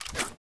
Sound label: draw, weapons; mgren